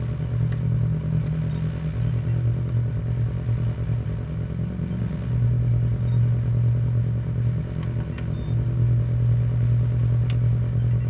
menu ambience

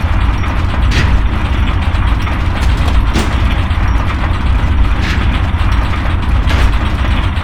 Aircrafts Engines Packard Merlin Prop Motor Dry; DCS

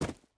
footstep boots gravel run; Rust

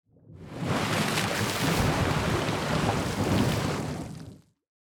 submarines engines; World Of Warships

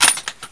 Inf Weapons Foley 3rd; Red Orchestra 41-45

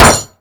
knife painted metal; Call of Duty Modern Warfare; physics

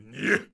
Knight Fort Vox; Civ4 warlords

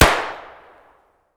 tt fire outdoor distant; Escape from Tarkov

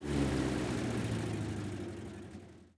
engines; World of Tanks

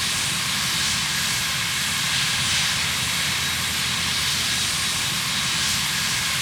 Aircrafts Engines Plane Front Engine; DCS

